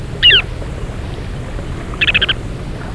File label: Arenaria interpres